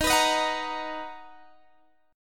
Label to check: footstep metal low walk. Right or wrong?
Wrong